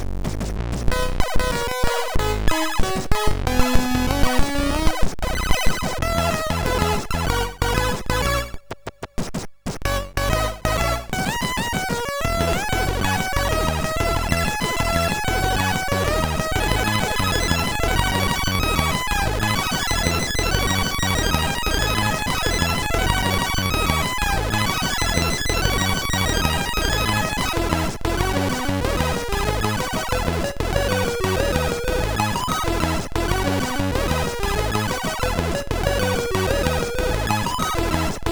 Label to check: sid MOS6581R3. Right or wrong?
Right